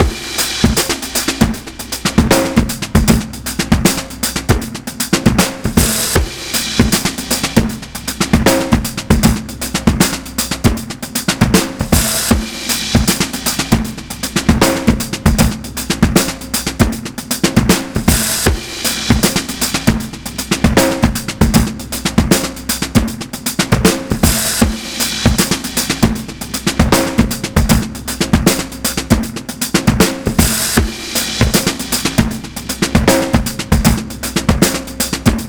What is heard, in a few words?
SHUFFLE 78 bpm; PURDIE SHUFFLE; 90 s Sample CDs; Planet of the Breaks